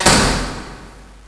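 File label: door close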